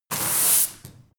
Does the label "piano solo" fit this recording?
No